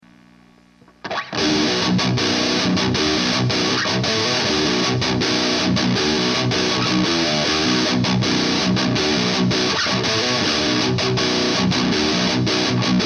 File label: 7 String